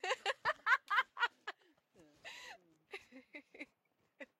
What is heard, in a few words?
Lady Laugh